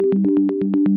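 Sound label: 122 BPM Arps A major G minor Tonic G; mmb studio soundtrack essentials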